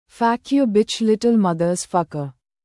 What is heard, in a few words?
clapping